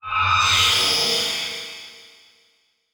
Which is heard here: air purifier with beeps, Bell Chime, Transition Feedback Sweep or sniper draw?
Transition Feedback Sweep